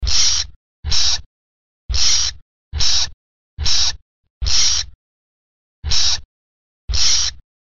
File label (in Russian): krot